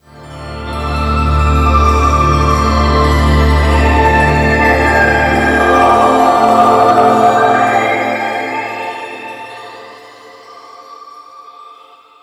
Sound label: pad, Drum & Bass, 160 BPM